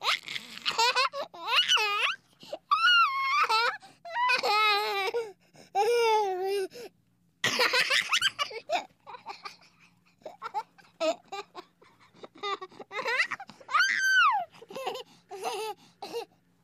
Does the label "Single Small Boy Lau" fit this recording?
Yes